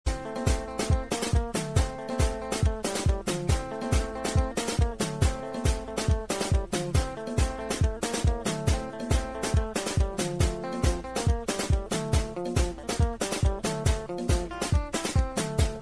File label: dance Energy Beat